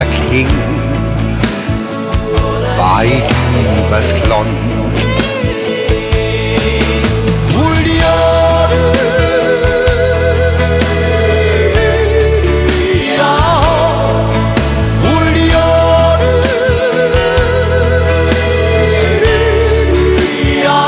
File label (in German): A Jodler fuer di